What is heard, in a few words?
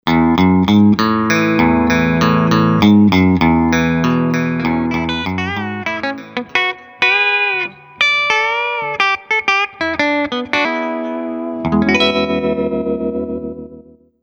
Electric Guitar